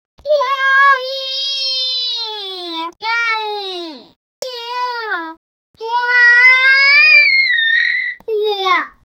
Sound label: villager child; minecraft; humanoid